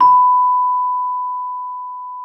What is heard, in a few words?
celesta H5; Xsample VOL-8